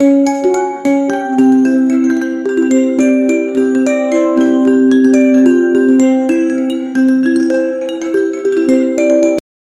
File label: modern instrumental lulla